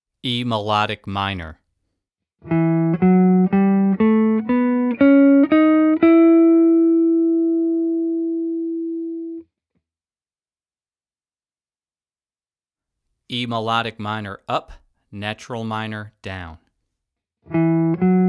E Melodic Minor

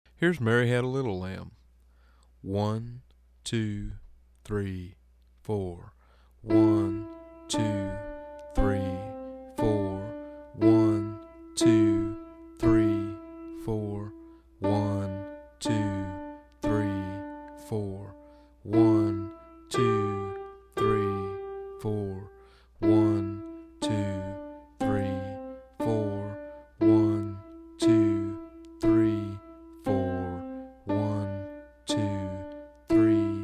Mary Had A Little Lamb Slow

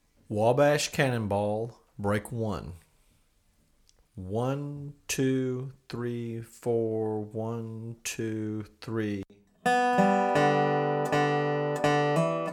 Slow; Wabash Cannonball

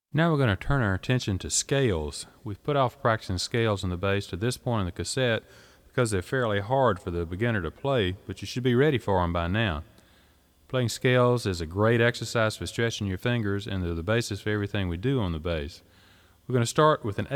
Scales

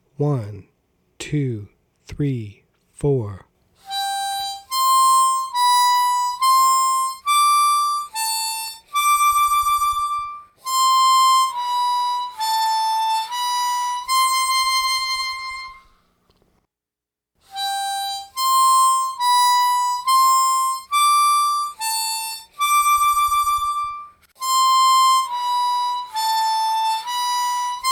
Slow; Aura Lee

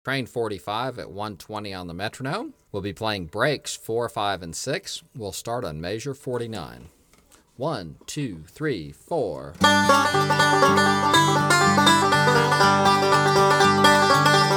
Breaks 120 Bpm

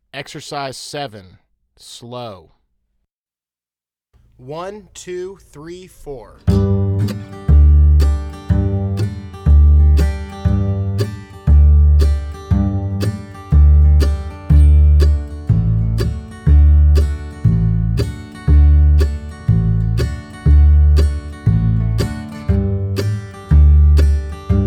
Slow

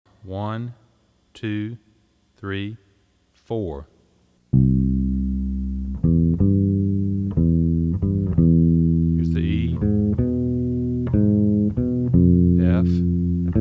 Slow Rock Slow

